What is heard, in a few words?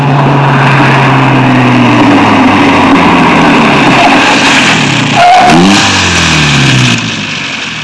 JAGUAR, auto; trasporti